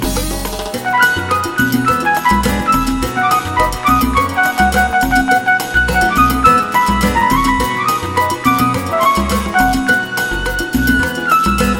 Classic Salsa

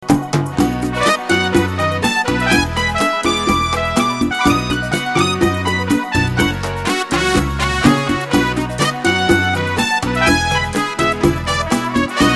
Cha Cha Rock

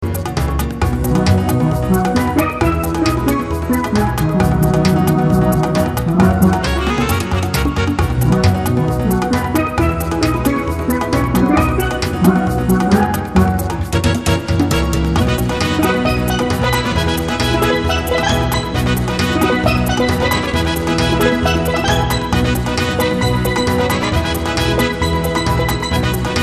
Dance the Merengue

